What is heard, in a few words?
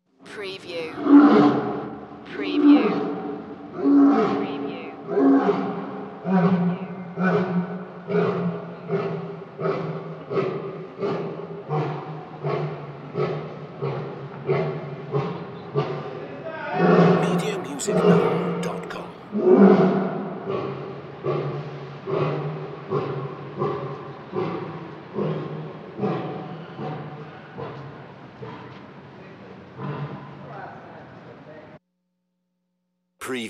Lion Mating Zoo Vintage